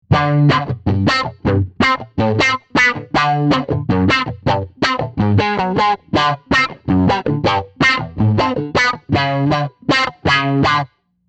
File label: Auto Wah